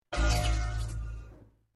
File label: forcefield touch